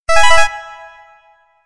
Future Ring

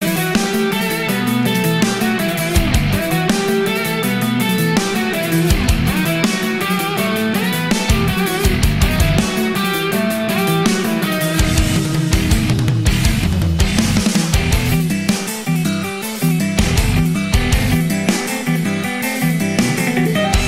Metal

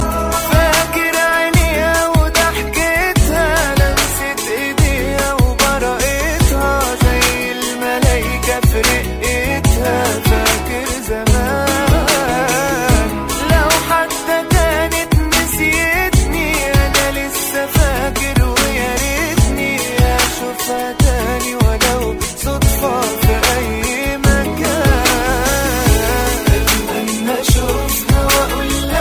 Arab; Faker Zaman

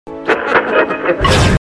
Radio Laugh; Alastor

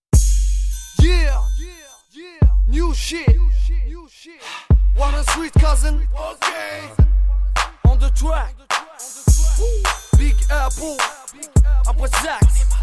Dz Rap

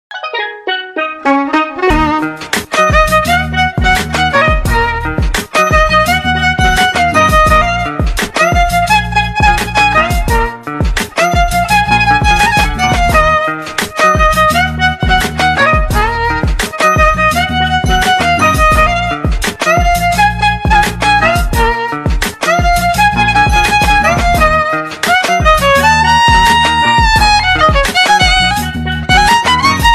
Violino

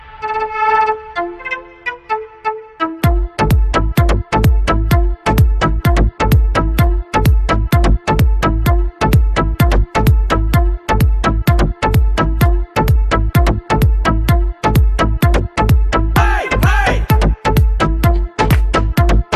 Dance Mix

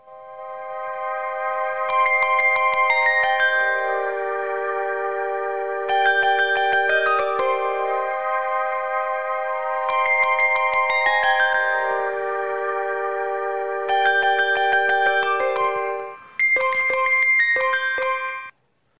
Ambient Mood

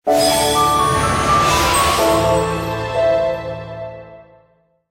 Gospel

